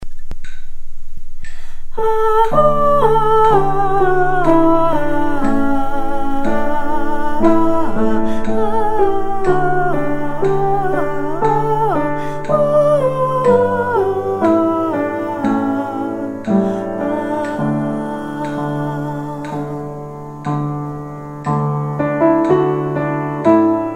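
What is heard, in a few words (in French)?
soprano tempo 60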